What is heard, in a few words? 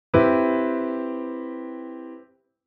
dissonance CM7